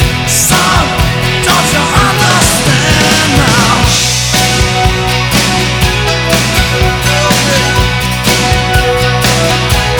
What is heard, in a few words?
pop